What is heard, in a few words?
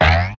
Boing, cartoon; Classic SFX; musicradar; cartoon caper